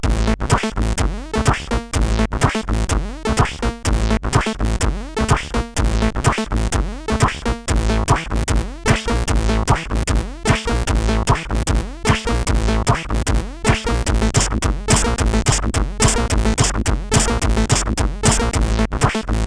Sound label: sid, CSG8580R5